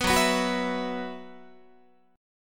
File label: Gb 7th Suspended 4th Gb down Guitar, chord